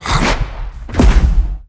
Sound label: berserk boss jump